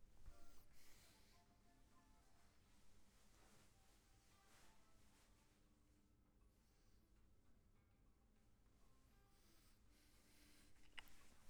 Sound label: flute; logic